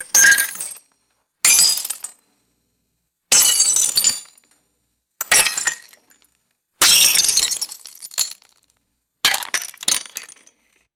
multiple sharp glass smashes and scattering shards